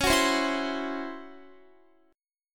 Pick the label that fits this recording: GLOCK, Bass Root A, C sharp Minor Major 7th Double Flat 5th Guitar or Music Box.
C sharp Minor Major 7th Double Flat 5th Guitar